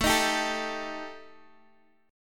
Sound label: Eb Major Flat 5th A